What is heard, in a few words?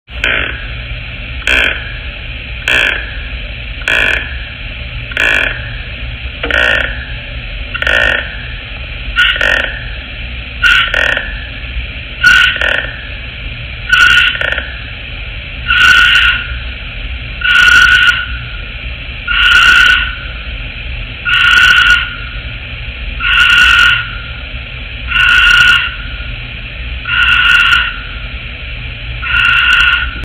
Tree hyrax